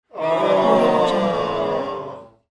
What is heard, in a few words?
crowd disappointment